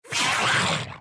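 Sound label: gargoyle attack; c gargoyle atk1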